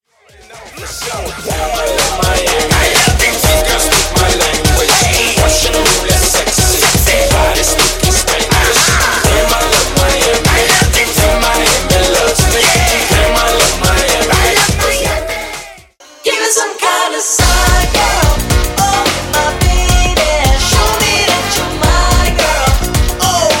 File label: Hip Hop RB 2K to 90s Megamix 124-87 Bpm